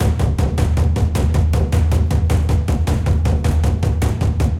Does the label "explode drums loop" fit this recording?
Yes